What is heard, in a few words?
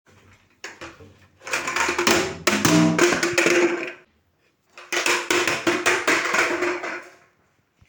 plastic pipe